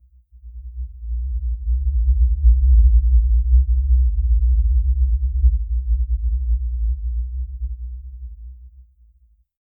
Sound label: a gentle magical sound, healing